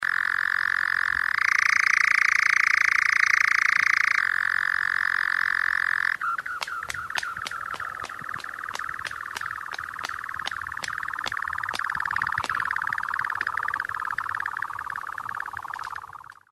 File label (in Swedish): Nattskarra